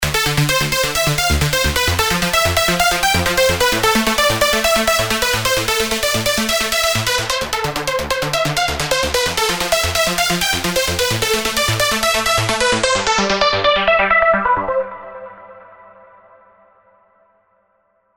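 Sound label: Spire, lead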